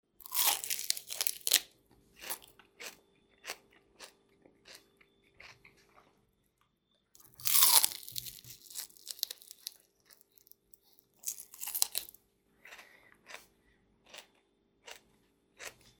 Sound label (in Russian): dva varianta s seldereem; Звуки откусывания